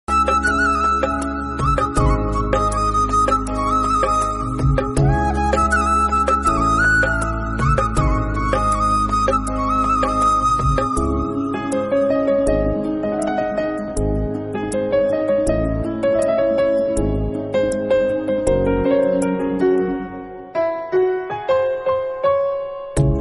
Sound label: Bollywood instrumental ringtone